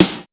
Korg 01W MegaDrums; 300 Drum Machines

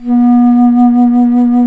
FLT, Bass Flute, BsFlt vib; Roland LCDP04 Orchestral Winds; 90 s Sample CDs

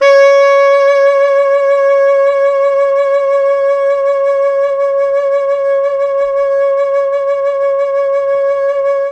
C#4 T SAX, Mellotron; Mike Pinder - Mellotron Archive CD

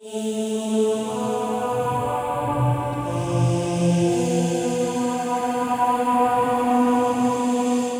Vox loop (120BPM); musicradar

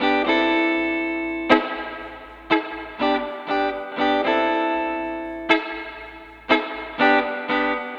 Guitar, 70s Analog Funk

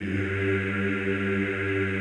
EEH G1, Classical Choir; Best Service; Extended Classical Choir

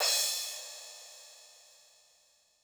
Percussion, Korg 01W; 300 Drum Machines

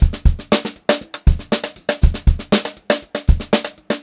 GROOVY BEAT, Funk Groove Drumloops

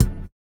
VIBRA; Spectrasonics; Metamorphosis

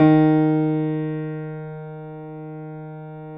JAZZ D#2 F, Keyboards Acoustic; USB Soundscan vol.17

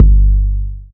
bass drum; Zero-G - Chemical Beats; BASSDRUM ZOO

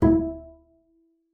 E4, bass pizz; Xsample VOL-5

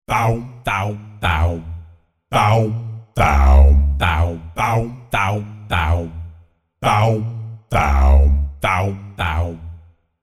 Jazz Bass Multisamples; ILIO - Vocal Planet VOL-6

